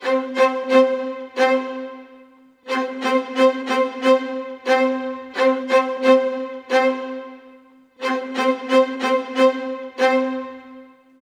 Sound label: 90 f min rhythm part, Orchestral arrangements